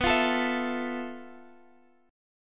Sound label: F Major 7th Flat 5th B, guitar chord